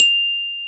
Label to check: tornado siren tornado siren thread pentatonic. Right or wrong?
Wrong